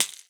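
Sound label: maracas; Xsample VOL-9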